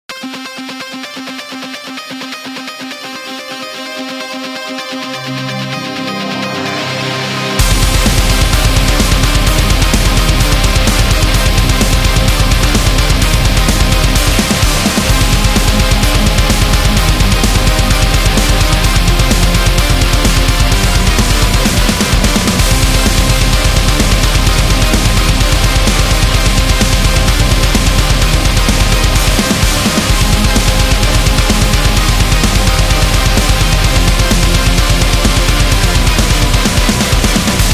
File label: Epic Extreme Metal